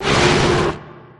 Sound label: cry